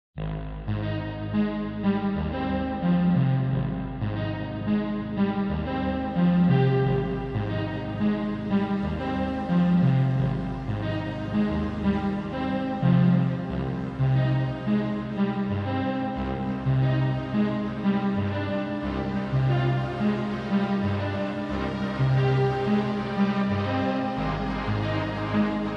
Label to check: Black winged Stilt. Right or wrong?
Wrong